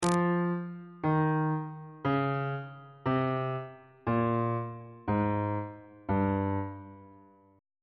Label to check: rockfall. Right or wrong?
Wrong